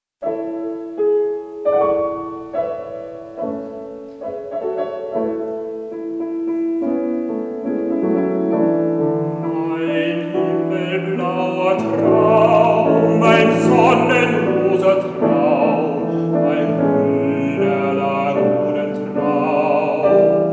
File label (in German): Venetianische Lieder - für Bariton und Klavier; Venetianische Lieder (Auswahl)